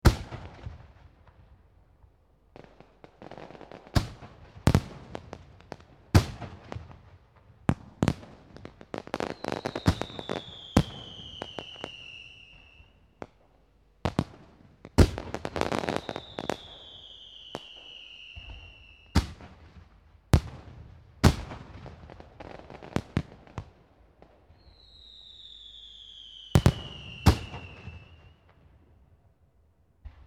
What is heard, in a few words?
Fireworks